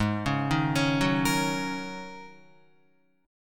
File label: Ab 7th Suspended 2nd Suspended 4th down Guitar; Ab7sus2sus4 arpeggiated